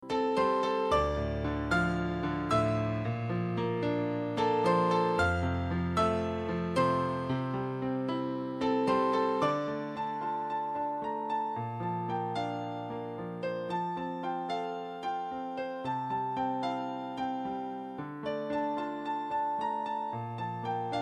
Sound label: Piano